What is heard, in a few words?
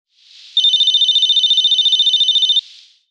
phone ring; ODST